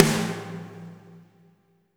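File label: reverb snare left; Spectrasonics; Burning Grooves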